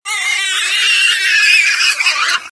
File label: tushkano miss